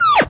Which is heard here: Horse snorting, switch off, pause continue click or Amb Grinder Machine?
switch off